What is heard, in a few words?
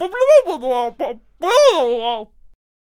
Potionseller Attack Vocals